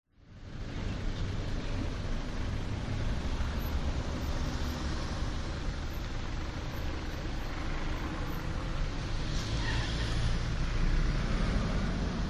vehicles traffic outdoor carpark shopping mall entrance australia; zapsplat